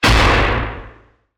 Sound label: Big Gun; SoundsCrate